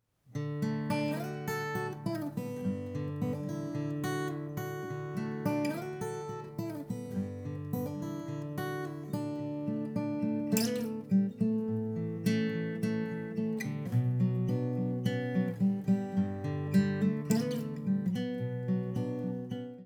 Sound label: guitar; phase 180